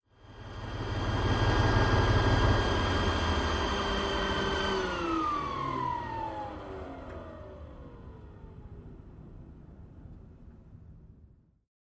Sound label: M60 Tank Ext Idle Shut